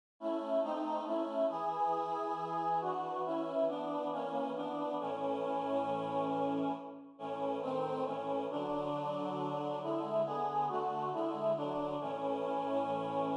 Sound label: The Wintry Day SATB a cappella; Finale Audio; christmas choral